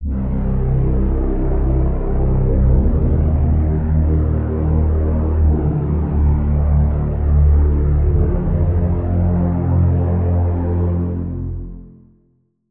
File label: Pad; Routed Sound; Serum 2 Expansion - Noble Gangster Vol.1